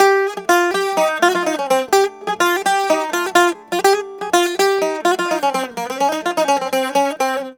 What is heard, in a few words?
Mediteranean Traditions; USB Soundscan vol.53; AKAI